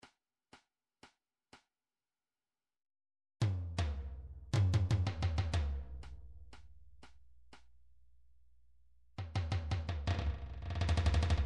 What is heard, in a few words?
tom; rumtrax library